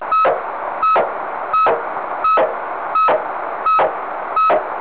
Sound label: bip